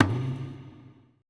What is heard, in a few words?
E TABLA